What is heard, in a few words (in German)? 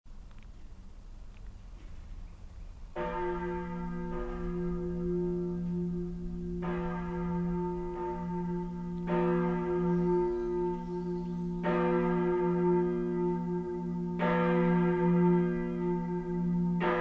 Benedicta, Glocke; Merseburger Dom